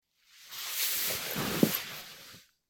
布ソファに座る音; sofa

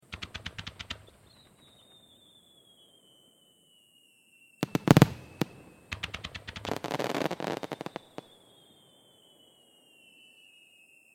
花火の音 連射; firework

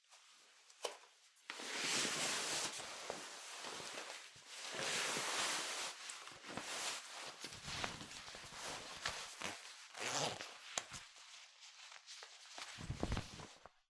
ジーパンを履く音

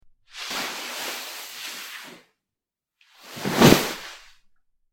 布団に押し倒される

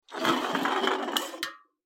空の缶が転がる音

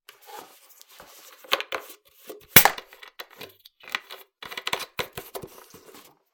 ペットボトルのキャップを外す音

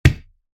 内側から壁を叩く音

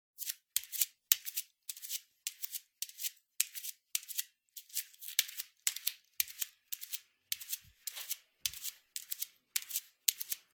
フローリングの上を裸足で歩く音

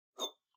ティーカップを取る音

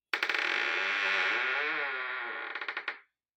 ドアがきしむ音; door

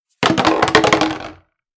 ペットボトルが転がる音

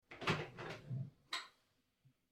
扉を開ける音

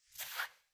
シーツの上にあるスマホを手に取る音

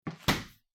冷蔵庫の扉を閉める音

